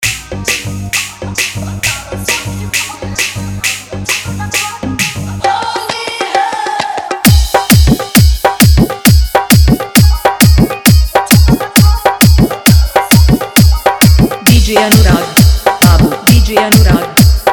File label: Holi Dj Song Hard Dholki Mix